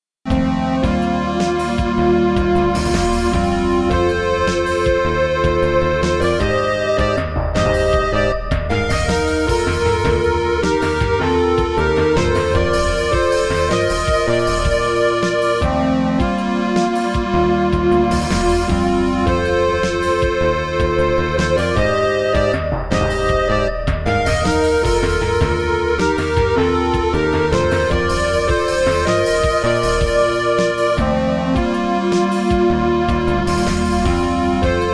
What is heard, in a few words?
Goal (MT-32); Super Hang-On; X68000